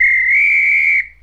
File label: Referee Whistle, Sports & Recreation; Sony Pictures Sound Effects Series